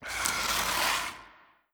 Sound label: zombie scratch, brickwall